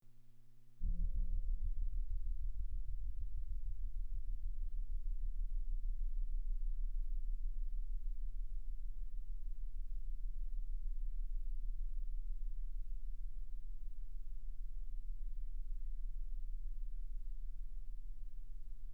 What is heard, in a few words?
beaded string